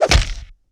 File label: Swipe With Bone Crack, Hand To Hand Combat; Sony Pictures Sound Effects Series